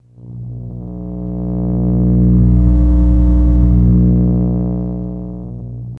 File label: Amp Hum Loop 60Hz; buzz & hums